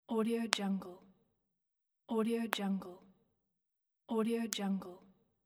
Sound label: knuckle crack